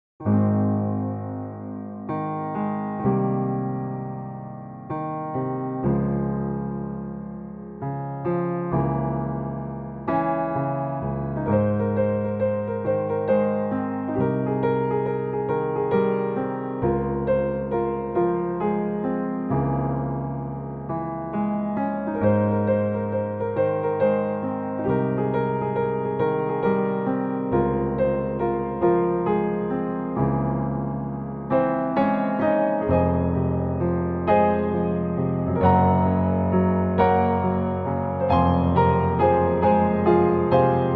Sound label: Piano Cover